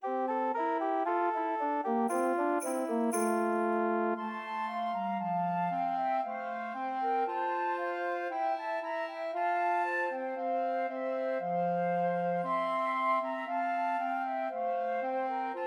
Deck the Halls, Christmas Trios for Two Flutes and Clarinet